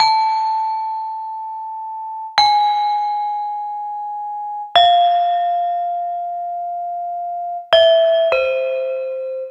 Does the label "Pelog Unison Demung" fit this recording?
Yes